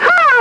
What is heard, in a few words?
Weasel; Yipe! III; Monsters